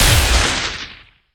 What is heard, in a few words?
Sci-Fi Weapons-Sniper Rifle Single Shot